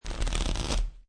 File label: Playing Cards Fan Flip In Hand